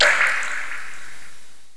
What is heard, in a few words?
water drop